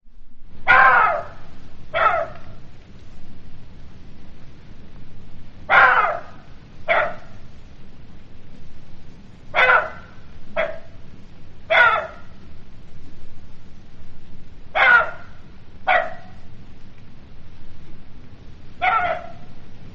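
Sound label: jackal sounds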